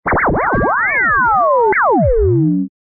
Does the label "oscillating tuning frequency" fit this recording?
Yes